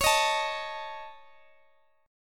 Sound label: Db Suspended 2nd Sharp 5th, Guitar, Open D